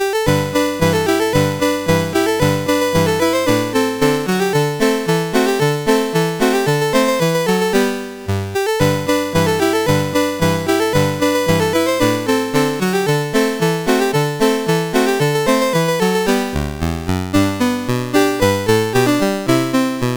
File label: Oh dem Golden Slippers, CSG8580R5